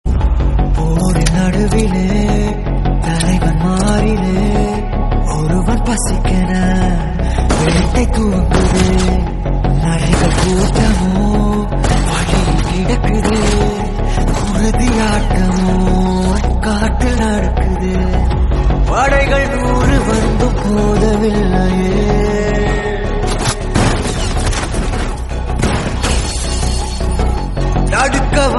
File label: song; vikram